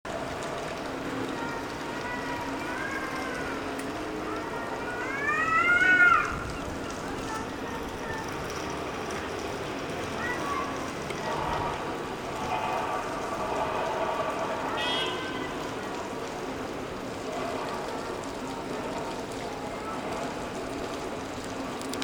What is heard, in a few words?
levent cat fight